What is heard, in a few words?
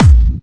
Clubby Kicks, Bassdrums